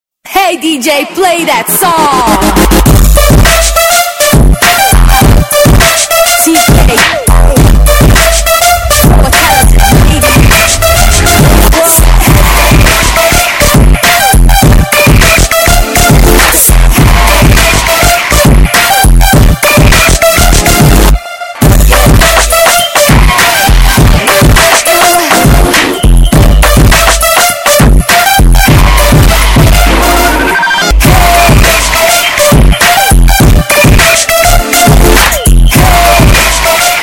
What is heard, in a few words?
boombass